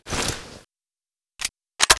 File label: long gun draw